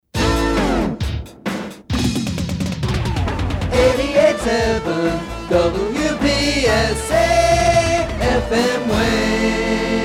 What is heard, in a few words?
JINGLE Legal Long